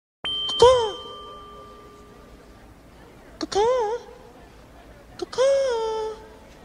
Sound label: bird calling kawkaw